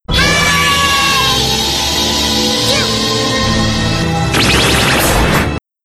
kick

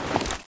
hand deploy, weapons; jb engine

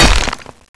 Wall, Rock Breaker

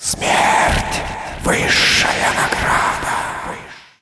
shade pissed; zombie plague

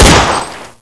gun; weapons; leon

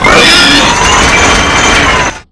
fire ready, weapons

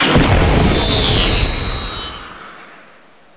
knife blink; knife v2; secondary

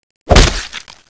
knife stab; knife v2

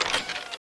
destroyer draw, weapons

